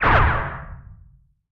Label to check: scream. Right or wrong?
Wrong